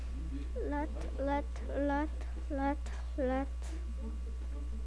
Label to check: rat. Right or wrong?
Right